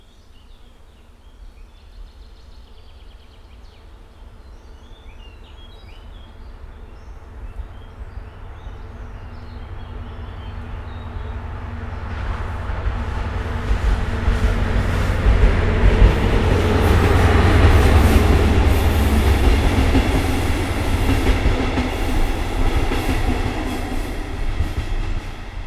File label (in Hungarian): nagyvonat, vonat kivulrol; vizvar felol; vizhaz; cardioid